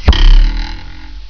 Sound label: arrow hit